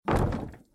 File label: stone hit wood